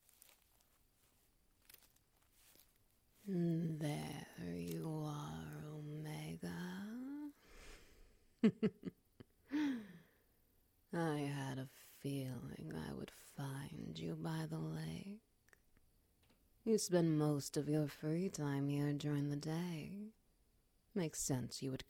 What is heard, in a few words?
Ambience, Wet Noises